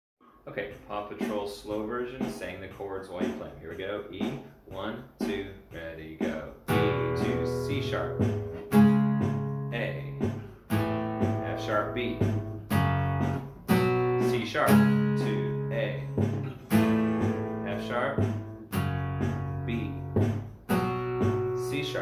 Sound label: Slow Chord Practice 120bpm